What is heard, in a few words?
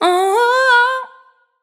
vocal riff up Cm